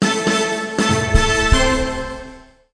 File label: Elephant Disco disco combo